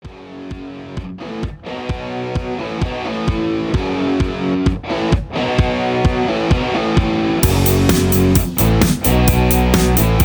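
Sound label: No Leads No background vocals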